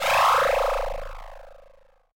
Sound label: PULSE RIFLE